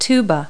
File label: Tuba; Multi-Lingual Talking Picture Dictionary